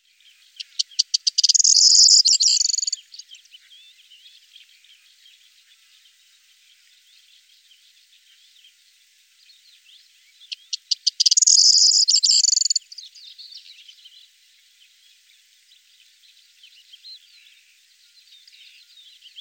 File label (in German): Grauammer